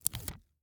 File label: Bandage Peel Apart; Medical; Blastwave